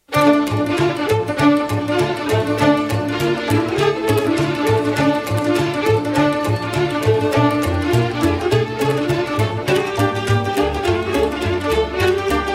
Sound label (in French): Ciuleandra - Roumanie